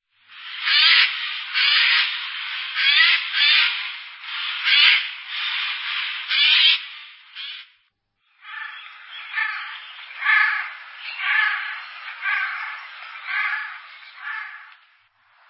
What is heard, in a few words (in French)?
geai